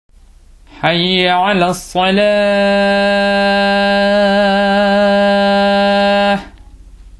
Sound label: Adzan